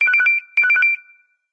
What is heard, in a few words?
Simple Beep